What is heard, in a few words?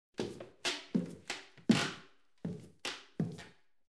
Old Wood Stairs